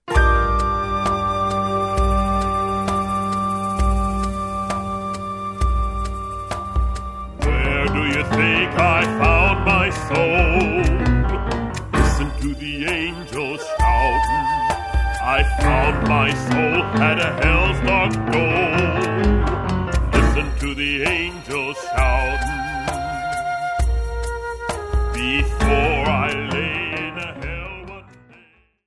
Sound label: shoutin